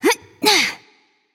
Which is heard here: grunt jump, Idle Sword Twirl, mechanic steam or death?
grunt jump